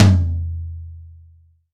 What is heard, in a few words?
acoustic tom drum single hit f sharp key